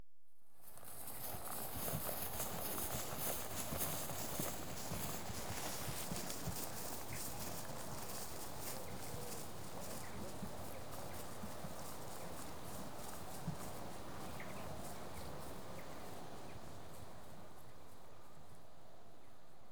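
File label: One horse walks past through grass; Horses; BBC Sound Effects Library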